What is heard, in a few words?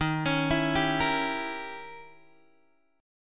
Eb 7th Flat 9th, Eb, Guitar, Open G